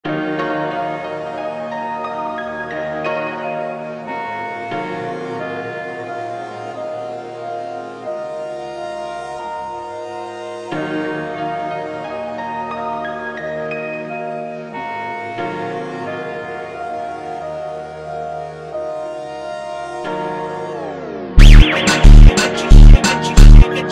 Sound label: FUNK DO PIANO